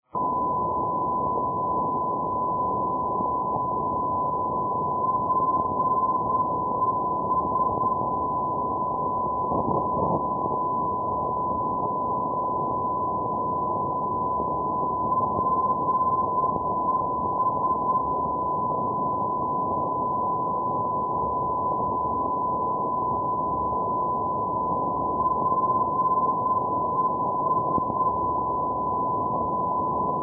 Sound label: ndb pescara pes